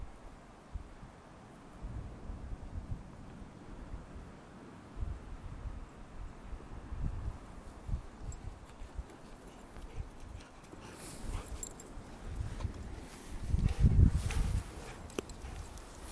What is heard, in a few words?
beach